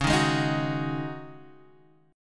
C sharp Suspended 2nd Suspended 4th C sharp Guitar Half Step Down, chord